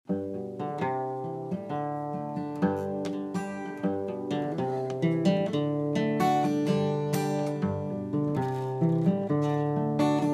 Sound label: 3 chords